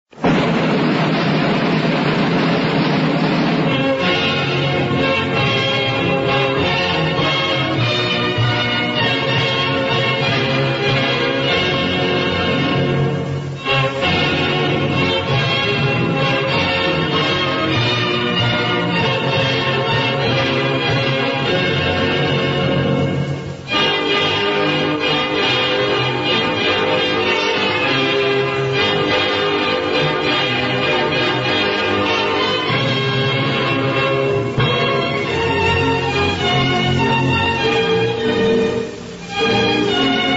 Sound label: anthem